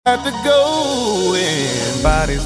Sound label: low go in